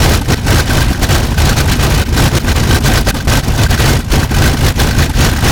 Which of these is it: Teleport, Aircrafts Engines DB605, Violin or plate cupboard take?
Aircrafts Engines DB605